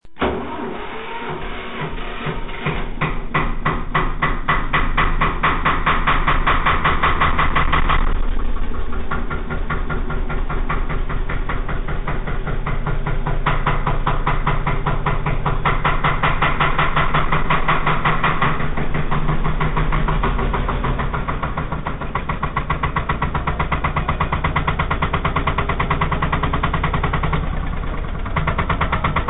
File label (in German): porscheklang